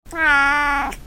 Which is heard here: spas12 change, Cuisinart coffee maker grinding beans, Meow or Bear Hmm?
Meow